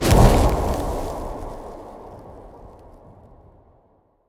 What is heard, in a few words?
shout frost breath